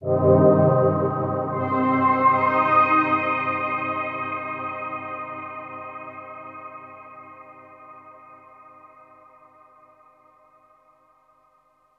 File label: synth pad ambient 160 C; Sphere